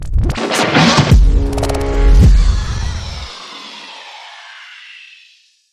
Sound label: Fizzer Drone